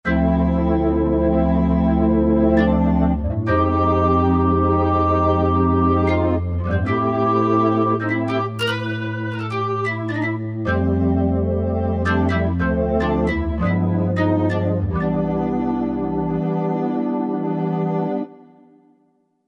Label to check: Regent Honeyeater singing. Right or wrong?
Wrong